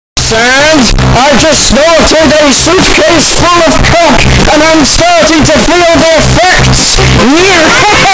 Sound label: nyeh nyeh nyeh